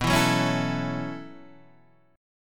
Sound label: B 9th Suspended 4th B down